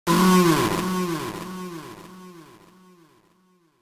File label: death; orc arena of time